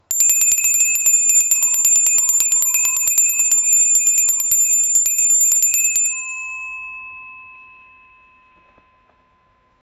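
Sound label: classic boxing bell ringing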